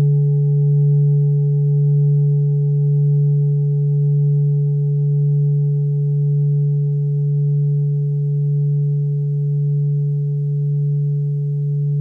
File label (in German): klangschale set; Durchmesser = 21,4cm; Höhe = 9,5cm